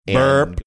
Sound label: burp